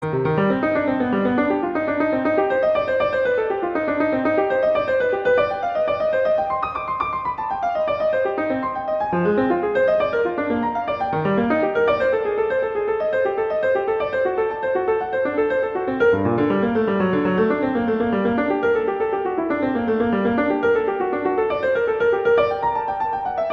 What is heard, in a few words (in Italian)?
Non troppo vivo; Bach CPE; Solfeggietto